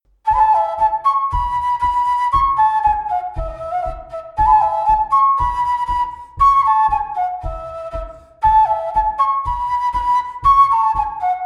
Bourree; Recueil De Raoulx

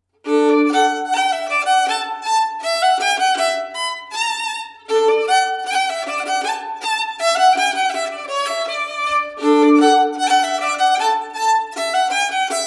Mazurka

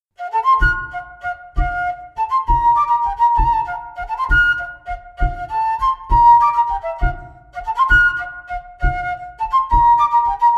bourree; Coatrieux

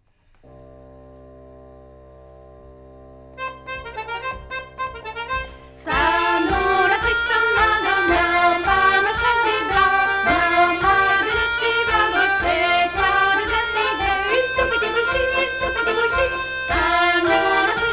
Polka piquee Vx Instr Fa sans arret x4

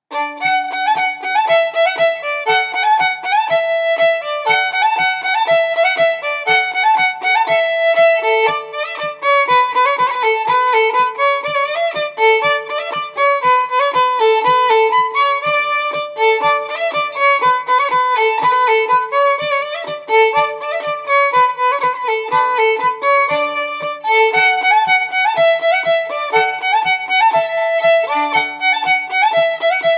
Bourree

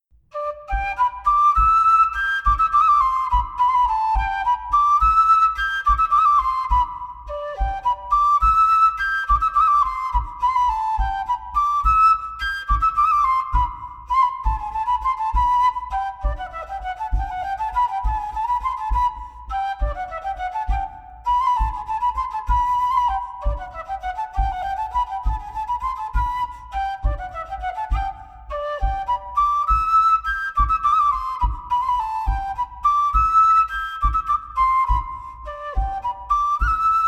Montagnarde; Claussmann

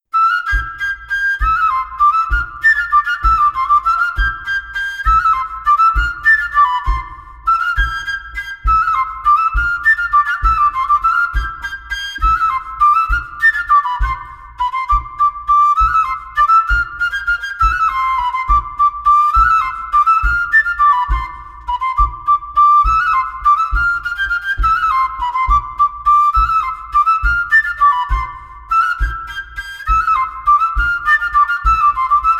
Montagnarde

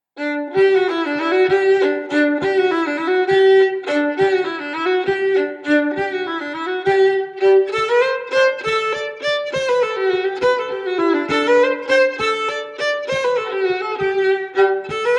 Montagnarde; Recueil Hainl 2; Montagnarde de Thiers

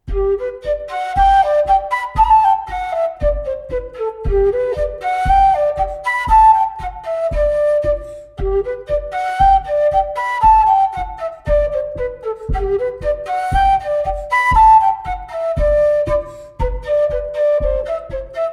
Bourree; Recueil Laussedat

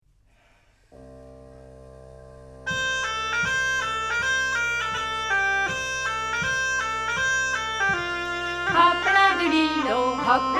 Rythme scottisch Vx Instr Fa; Hopladeli

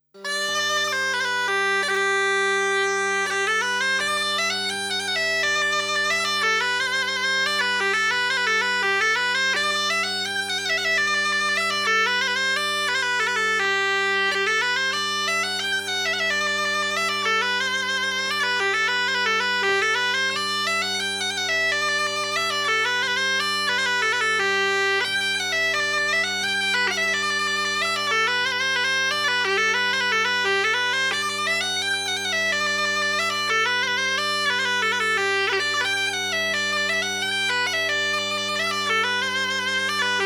Scottisch; Scottisch de Madame Doudet

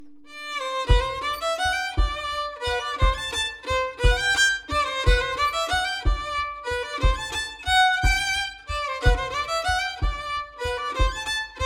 Bourree d Auvergne

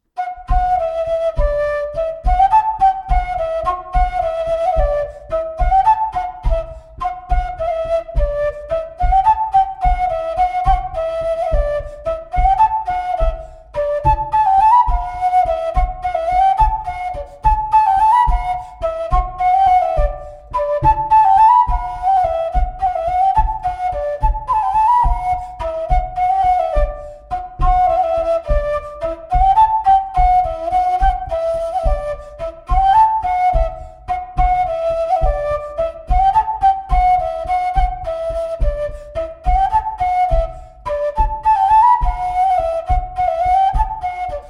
Montagnarde; Recueil De Raoulx

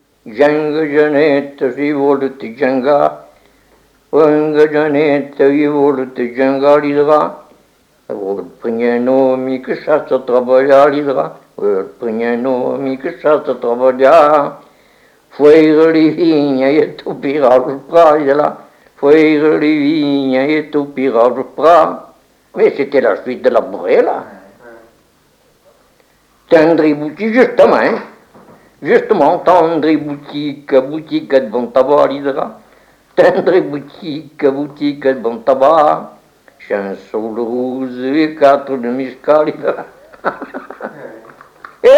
Bourrée des anciens; Janga Janeta

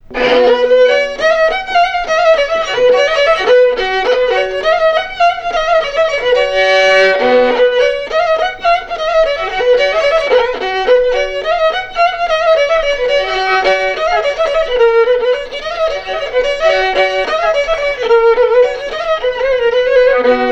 Valse des conscrits

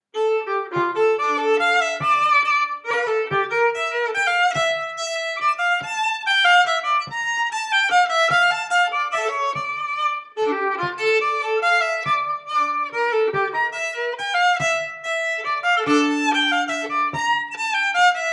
Valse

